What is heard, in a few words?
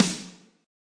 snare yamaha gs; single hits